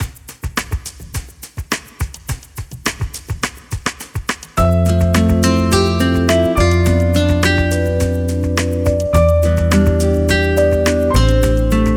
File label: Music Lounge; SandBox Musics; musicAsSound